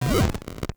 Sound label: Corrupted Notes